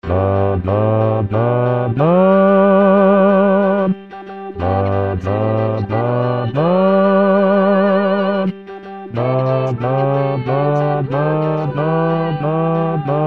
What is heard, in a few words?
bajo